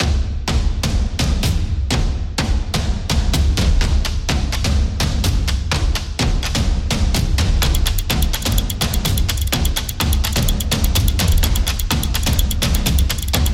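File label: The Soccer Intro, Sport percussion background music created for action, competition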